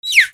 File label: BIRDS